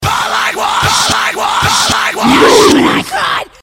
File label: Scream